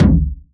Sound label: Tom 80s Space Craft; Drums